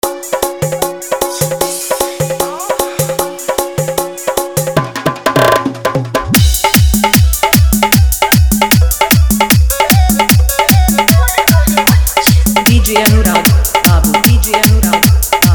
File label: Full Dholki Bass Dance Mix